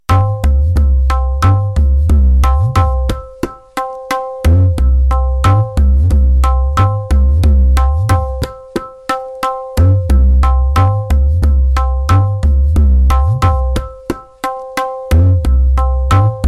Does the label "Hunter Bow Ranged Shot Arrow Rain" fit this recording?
No